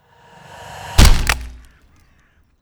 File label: propel hit